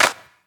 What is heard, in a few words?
Snares & Claps; Vengeance Samples